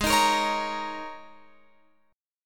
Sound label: G sharp Suspended 2nd Suspended 4th, G sharp, down, Guitar, Open F; strummed